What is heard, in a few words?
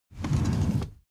car seat slide forward fast